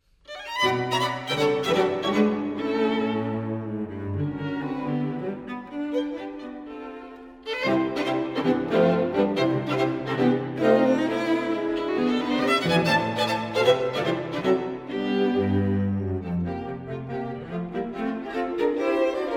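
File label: quartet; schoeck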